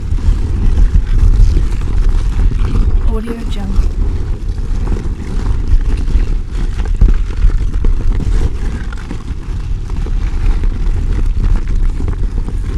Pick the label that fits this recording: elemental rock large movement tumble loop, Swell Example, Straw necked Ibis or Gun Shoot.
elemental rock large movement tumble loop